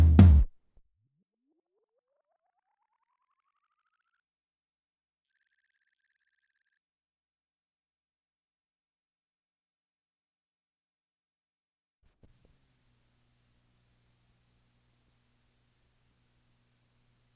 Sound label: floor tom